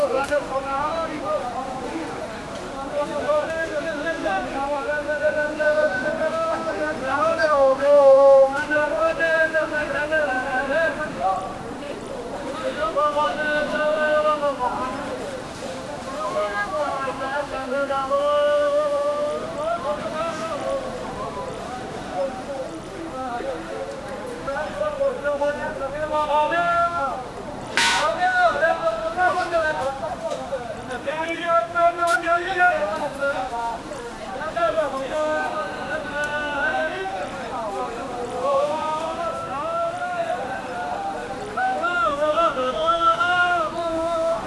arabic market many voices; middle eastern

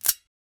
short dry metal magazine, gun

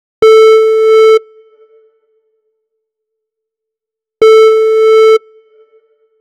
a little brink with a, synthwave

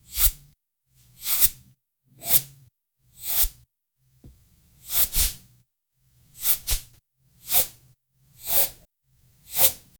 light swish of brush swip

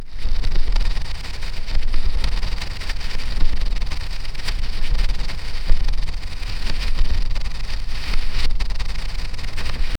asmr a single dry page; sound effect; books

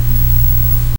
cozy game satisfy

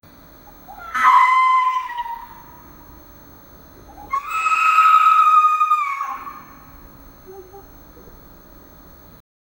the tower was burning rap, screaming

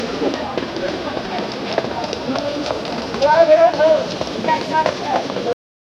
gradual fade in of 1880s, city

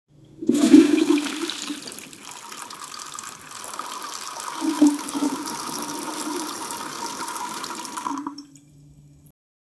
steady tiny stream of wat, bathroom

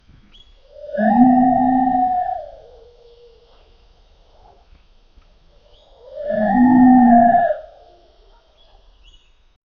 spectral bear roar

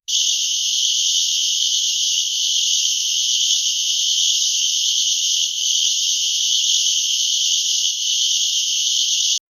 a loud buzzing sound, robotic

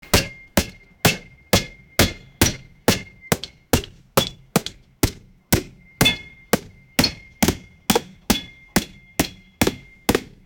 smashing a hard appel wit; baseball

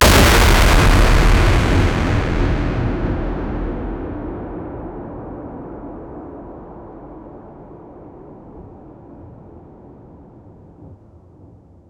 impact frame slice effect

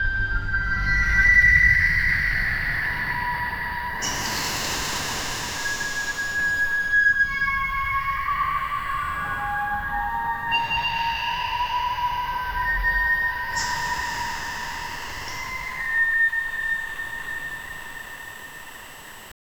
creepy and eerie child wh, music box

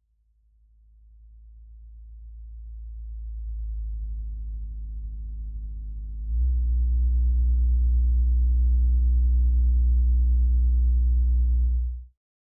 straight cut transmission, acceleration

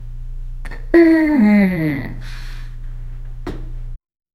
people falling to bed

